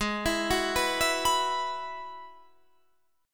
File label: G sharp Minor 7th Sharp 5th G sharp, Guitar Half Step Down